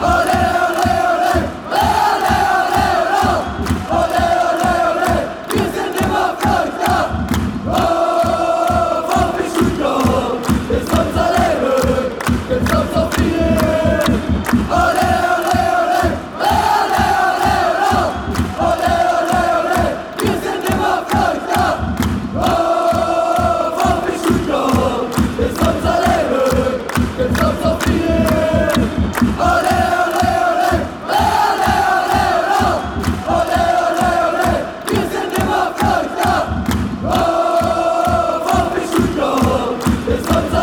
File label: ole, fanchants